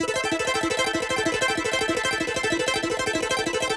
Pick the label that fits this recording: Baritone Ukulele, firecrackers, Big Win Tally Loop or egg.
Big Win Tally Loop